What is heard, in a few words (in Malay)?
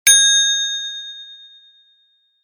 Kesan Bunyi Bell